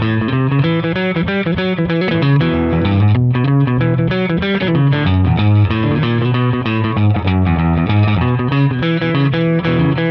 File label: Verse Partial Progression Boogie E; Loops